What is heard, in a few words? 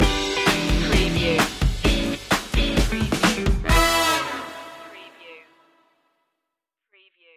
re rock funk stinger